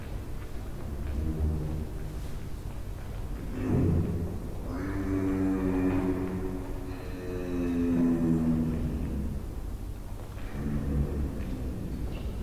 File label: Giraffe hum